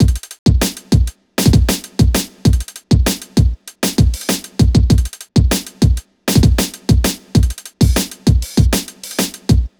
Drums, 098 Bmin, Hip Hop Exotica; Apple Loops; Big Fish Audio

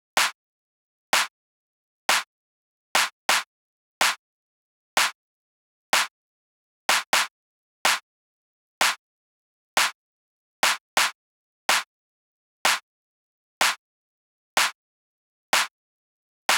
Claps, Future House 125 BPM A#; Big EDM; Future House Tools; Construction Kits; Clap That

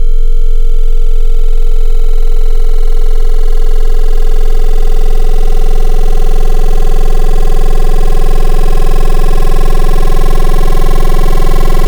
Jagged Sine, wavetables; Cymatics; Jawz Wavetables; Serum

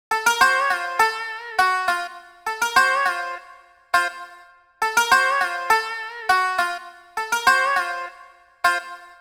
Tzoura; Big Fish Audio

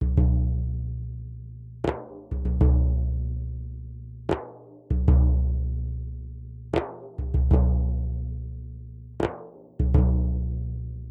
duff 098, Hip Hop India, 098bpm

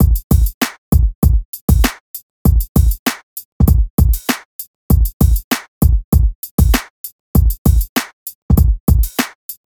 Drums, Hip Hop Exotica, 098 Emin

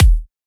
EDM Future Kick

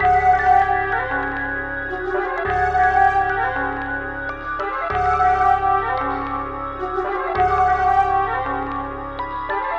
Hip Hop, 098 F#min; AppleLoops

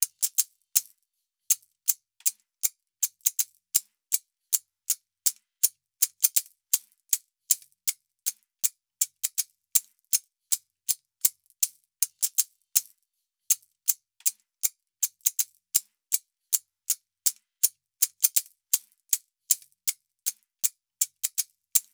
shaker B 080, Hip Hop India; Hip Hop India Modern Percussion; Apple Loops

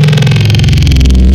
Feedback, Wavetables; Cymatics; Secrets Wavetables; Serum

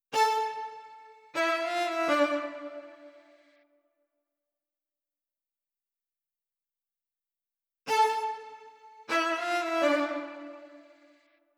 124 BPM A Min Strings; Cymatics; Destiny Gold Expansion; Dior

